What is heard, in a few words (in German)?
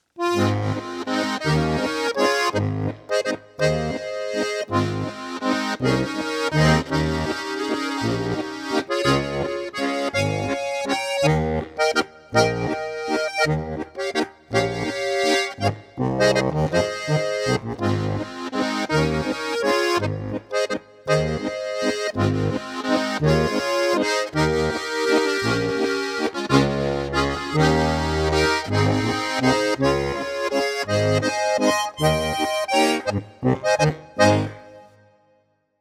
2 Chörig Flach Walzer B Es As Des